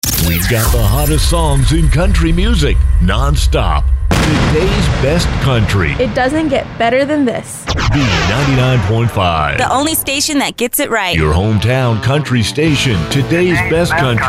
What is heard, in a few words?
Country Samples